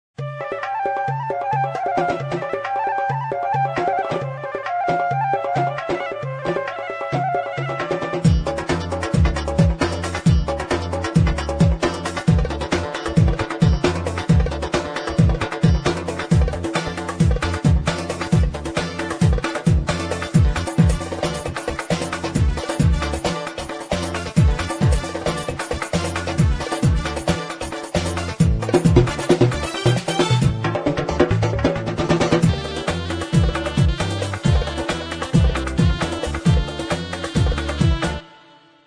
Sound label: roman 9 8, turkish; pa80; korg